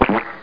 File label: SPLAT